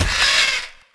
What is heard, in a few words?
egg hit